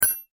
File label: impact metal small; Materials; McKinney Sound